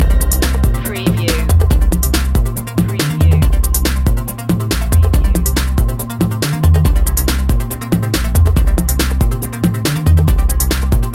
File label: electric dreams loop